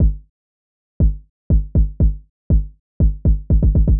909 x-over; hydrogen

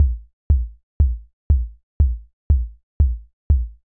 Kick Short; hydrogen

